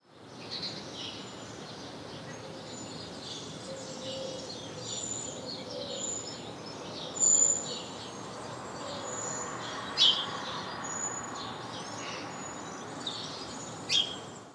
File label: Turdus merula; SETE